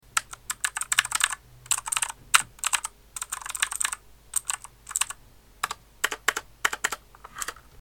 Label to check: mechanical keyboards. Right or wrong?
Right